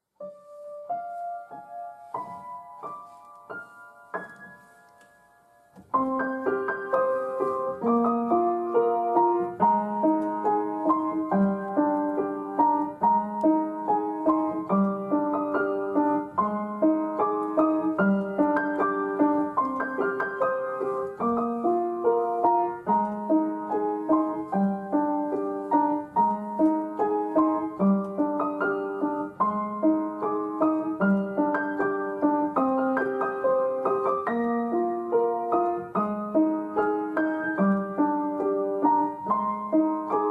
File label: theme (musicbox)